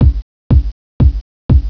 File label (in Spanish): bombo; primaria 1 2; unitcircusweb; Presentacio